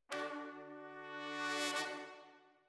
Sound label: ORTF, Jazz for piano